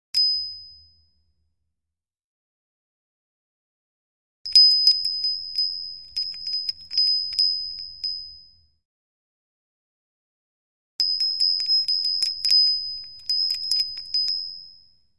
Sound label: Bells Small Bell Rin